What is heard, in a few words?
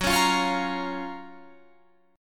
F sharp Major 7th, Guitar, Drop D